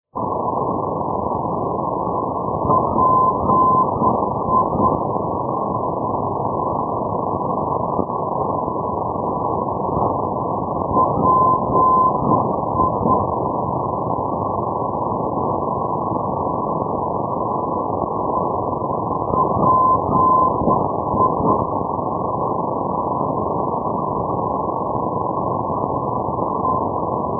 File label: ndb schwerin-parchim; europe